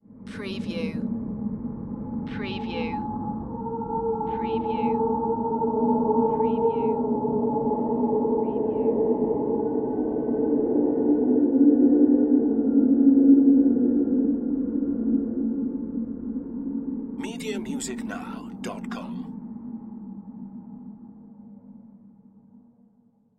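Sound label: Production Element Swell Ghostly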